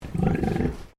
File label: Animal Pig Landrace Inside Grumble; Edgar; Edgar's Mission Farm Victoria; Pigs; Animals